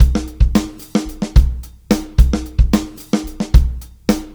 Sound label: Live Beats, R&B Drums